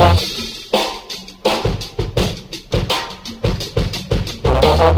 oompah band, Funky Drum loops

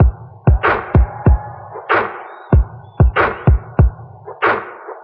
cheeks and whispers, Drum loops, Boombap

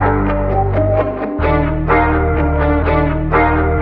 guitar

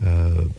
huh male, Voice

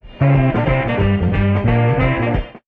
horns, loops, phonky

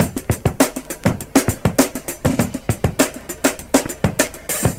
turntable, drum loops, funky

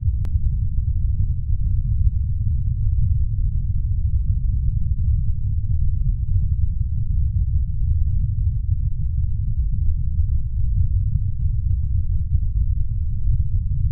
ominous, Rumbles

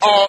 "ah"

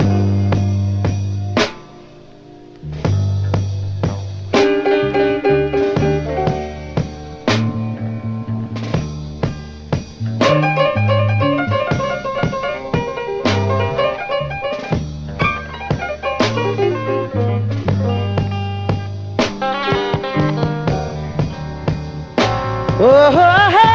full blues notes, Loops, Phonky

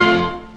Stabs

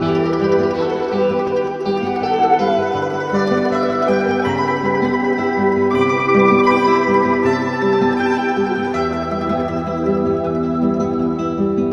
guitar

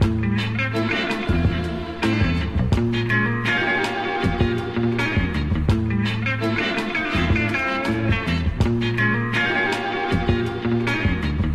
guitar, Loops

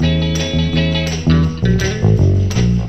guitar, Phonky Loops

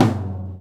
Toms

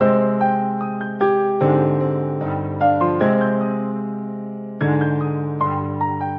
piano, sad

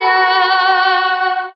ouh, Voice

